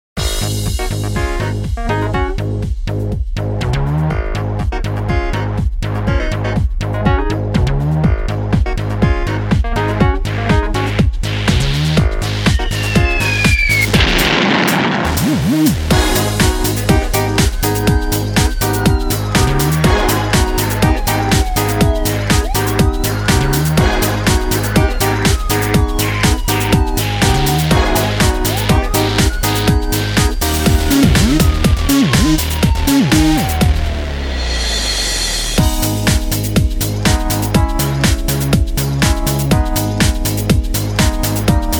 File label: brass minus